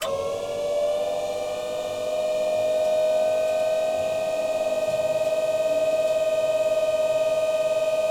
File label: MiG-15bis starter pump, aircraft; DCS